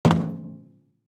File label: Footstep Armor Heavy; Footsteps, Armor